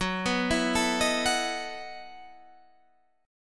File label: E Major 7th Suspended 2nd Suspended 4th Gb